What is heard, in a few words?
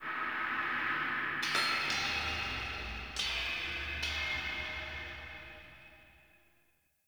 Warrior Echo, Crypt Ambience; One Offs; Champion Crypt